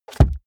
car bmw e46 interior sun visor shut soft

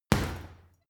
boxing punching bag hit hard; sport-freizeit; boxen

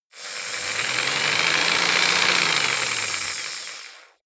jigsaw engine slow medium length

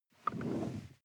sports car int seat slide; verkehr transport; autos

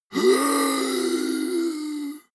monster growl hoarse; koerpergeraeusche; comedy-cartoon; soundpool

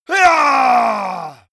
monster growl attack throaty; schreie

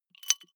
flip-top bottle rattle, gastronomie; berufe

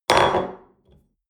espresso upset table hard, restaurant cafe

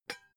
pot lid take off gentle; objekte; topf

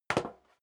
step sneaker metal dry single stair down

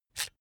electric drill template adjust regular; soundpool

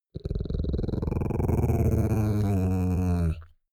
chihuahua growl long; natur-tiere; hunde

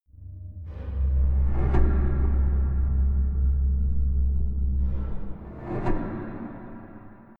drone impacts

